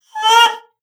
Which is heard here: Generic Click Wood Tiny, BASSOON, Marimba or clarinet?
BASSOON